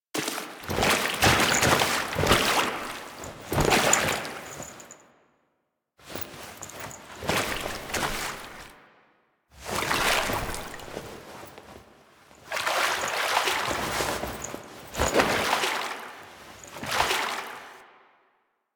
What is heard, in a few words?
Intro Foley Water; Archon Death; Drifter; Warframe; The New War